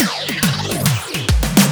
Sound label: Fill G